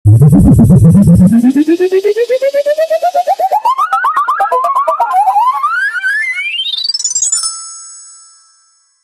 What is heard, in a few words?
sound intro kartun 2d, animation